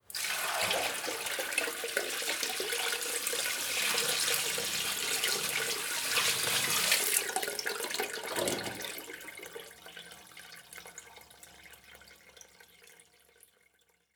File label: Water Bucket Emptying